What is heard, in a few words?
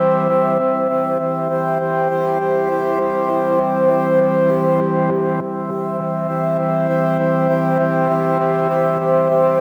Walker Pad; пэды